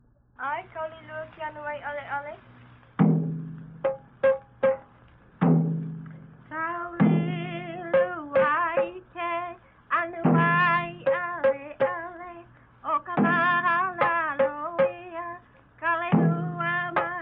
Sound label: Name chant, Hawai'i; Na Leo Hawai'i Kahiko - Voices of Old Hawai'i; King Kalakaua